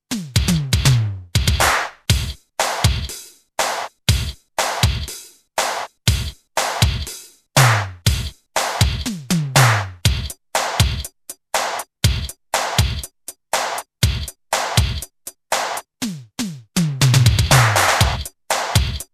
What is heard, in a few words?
Akai XR10 Beat Word